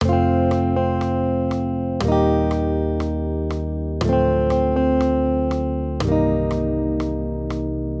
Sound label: maj triads